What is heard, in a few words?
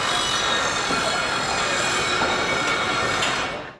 build; Mafie 1